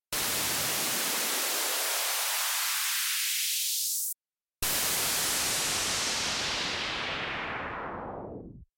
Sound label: High Pass And Low Pass Filter